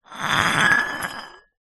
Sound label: glass bottle beer drag concrete ground; foley